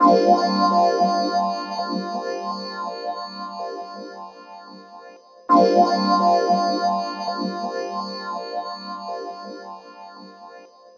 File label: EP, Pads; Sonic Foundry (Sony Creative Software); Synchro Funk